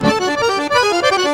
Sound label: ACC BRK, ACC BREAKS; Best Service; World Colours